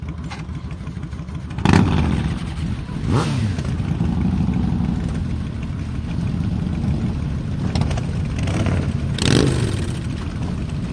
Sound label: motorcycles; Sound FX Real Life